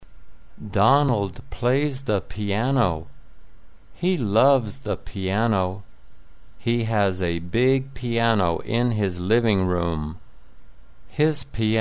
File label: piano player